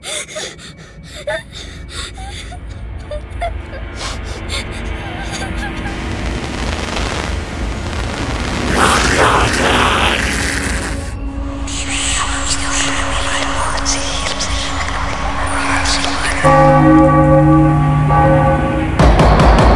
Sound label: HORROR; افکت صوتی سینمای وحشت جنیان